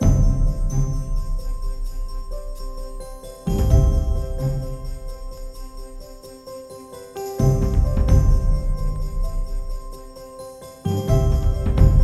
Forest Horse; barbie horse adventure wild horse rescue; ps2 xbox

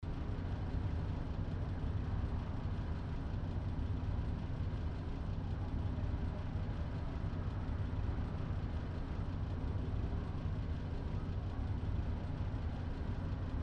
Parking Garage Ambience

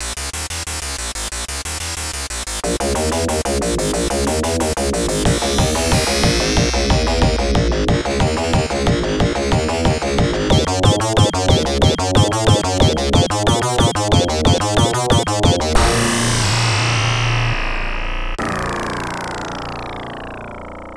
Game Win (Ending Theme); Vectorman 2

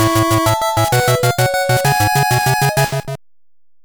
Victory!; Monster Crown original soundtrack 2022

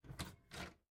Door Open; miside demo windows gamerip 2023

